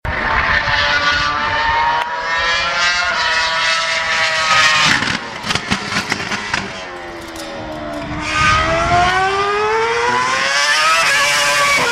F1 Ferrari

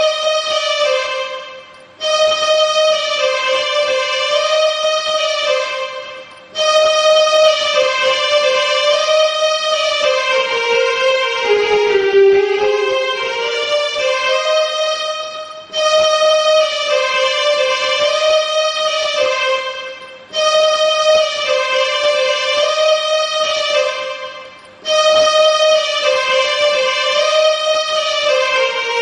Keyboard

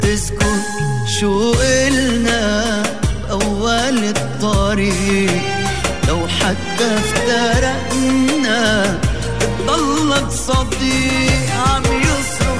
Arabic Sad

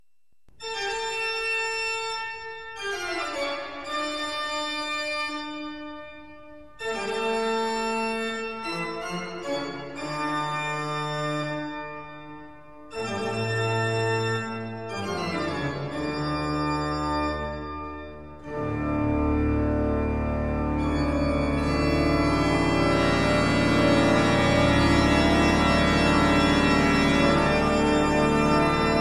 Toccata

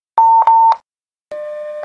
Roger beep

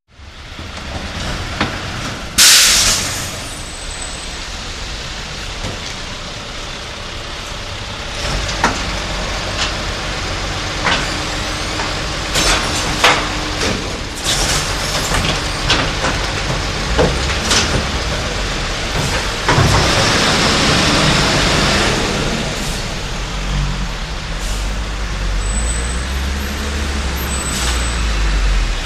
Bus Noise

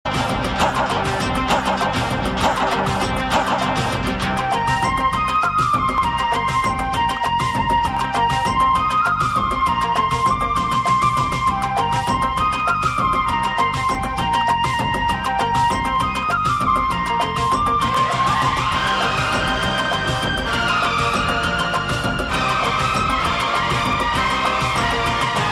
Flute Music; Toofan

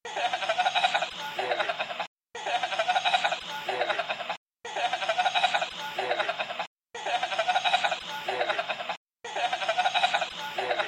laugh repeat

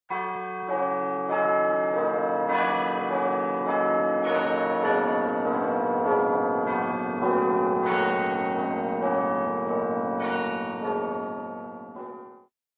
Tower Clock

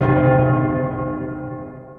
Clock; Alice Morbid

